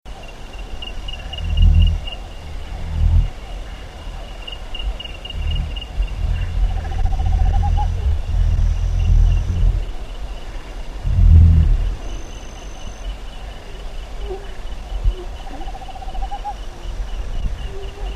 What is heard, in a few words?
White-winged Nightjar; S. America